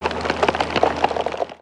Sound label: Siege Tower Debris; destructibles